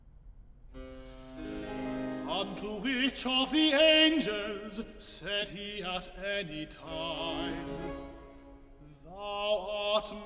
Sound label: recit unto which of the angels